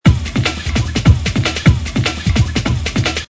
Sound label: drumloop